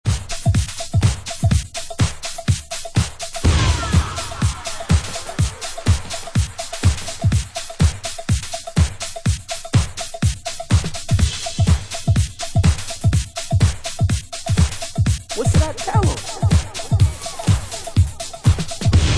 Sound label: what's up with the drums